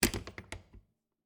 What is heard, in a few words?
Refridgerator, old fashioned, wooden, steel, brass clasps, hinges, open, jostle, clatter; Eiravaein Works; Vaeyan IV